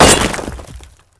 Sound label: duang; ChaosFantasy